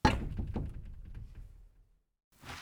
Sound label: glass land; teardown; ps5 windows xbox series xs